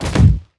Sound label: Grounder Climb, Kraken; Evolve